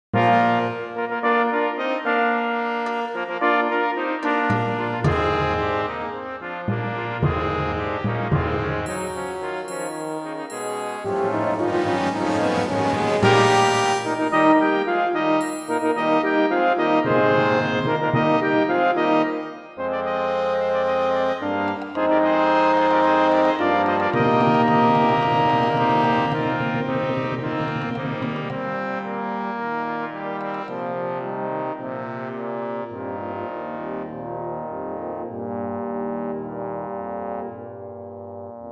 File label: Fanfare